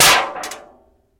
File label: Aluminum Siding Impact